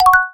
Success; Eft; Mini Game; Monster Corporation

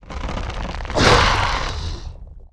MANDRAGORA attack; Eft

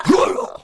Magic North Wind

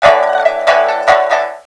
EAST GUARDIAN attack; Eft

